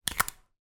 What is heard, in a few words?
electric razor foil protection cap place on